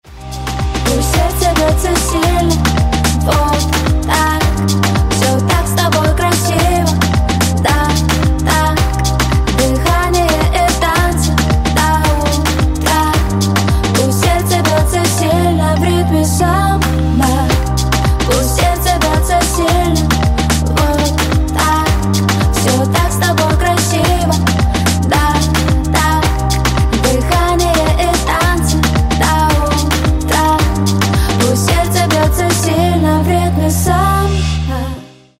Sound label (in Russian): v ritme samba